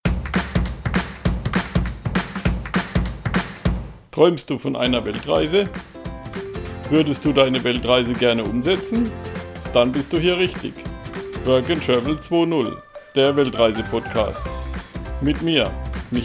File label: Intro Upbeat